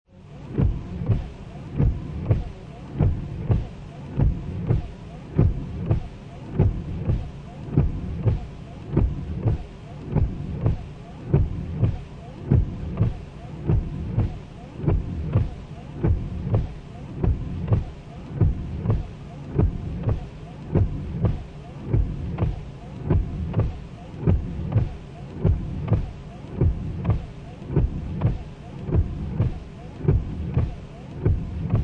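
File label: Car Interior Windshield Wipers High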